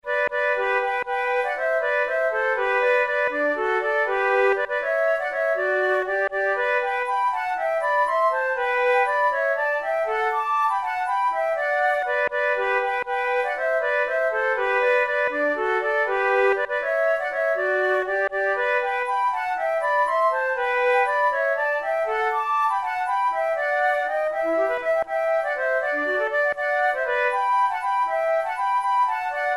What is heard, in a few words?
duet, flute